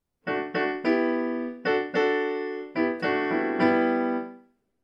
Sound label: Gospel SAT altered rhythm